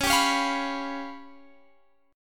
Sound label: C sharp 7th Flat 5th C sharp